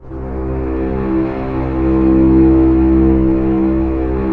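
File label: strings C1 PP; Sound On Sound - Peter Siedleczek's Orchestra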